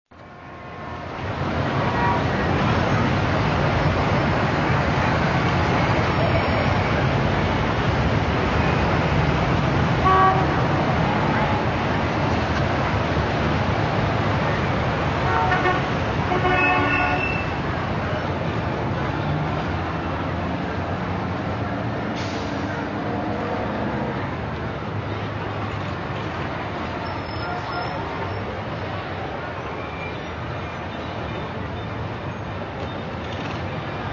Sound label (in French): ambiance ville